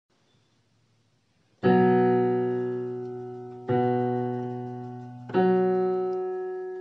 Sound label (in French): quinte